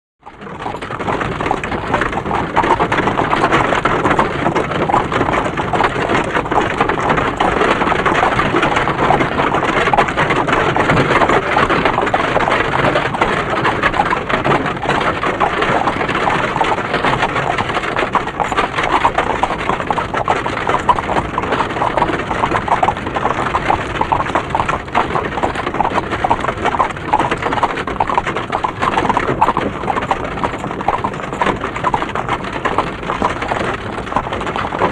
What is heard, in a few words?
Away Horse Cart Start, carriages